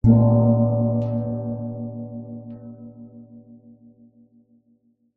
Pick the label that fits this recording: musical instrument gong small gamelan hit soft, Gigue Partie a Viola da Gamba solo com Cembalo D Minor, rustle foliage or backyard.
musical instrument gong small gamelan hit soft